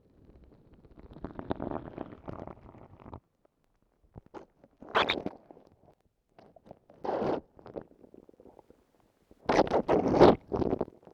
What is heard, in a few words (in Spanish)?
toma mate